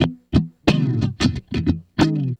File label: Guitars, A; Total Drum&Bass; 90 s Sample CDs